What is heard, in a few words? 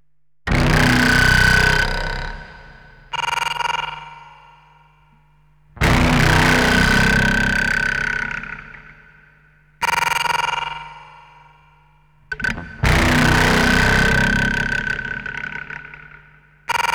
Japanese Expressions Of Guitar Solo; Japanese Expressions Of Guitar Solo 3